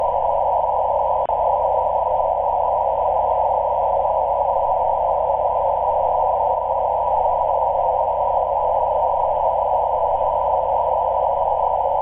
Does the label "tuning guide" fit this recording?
No